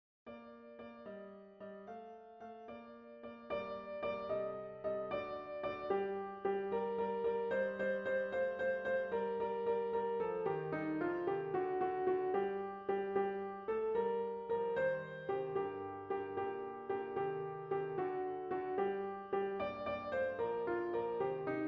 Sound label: Tarantella